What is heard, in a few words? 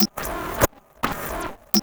CYBER DOOR, CYBER TOYS; Spectrasonic Distorted Reality 1